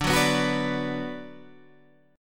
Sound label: D 6th D down, chord